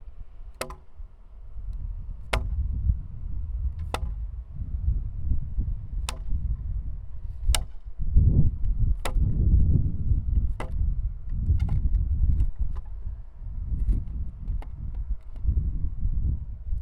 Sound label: Wood hit; fieldrecordings